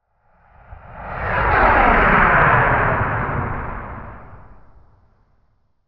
missile fly by quickly

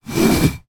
household chair small drag carpet; zapsplat

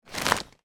foley present wrapped pick up

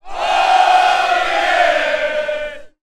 human crowd soccer x150 shout ahh yes; sound effects one

